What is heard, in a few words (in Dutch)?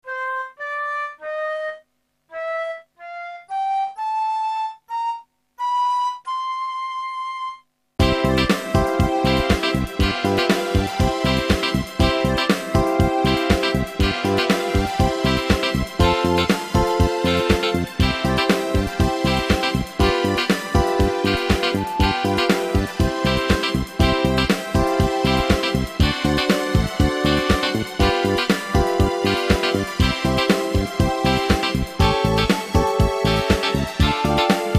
blokfluitles karaoke